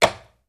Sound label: Switches, Industrial